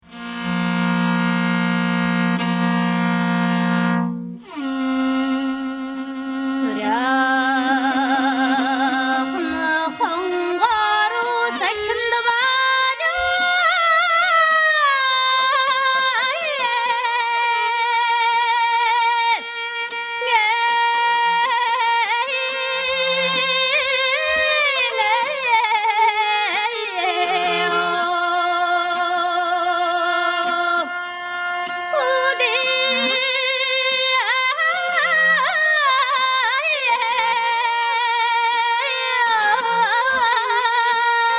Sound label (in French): chant, ulanbator